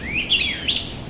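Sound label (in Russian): мелодичное пение белоглазого виреона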